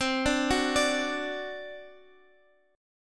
C Suspended 2nd Flat 5th C down Guitar Half Step Down